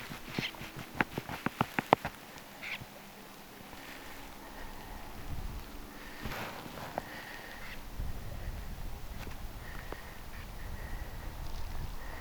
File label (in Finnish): ilm jonkinlainen suippopyrstokurppalaji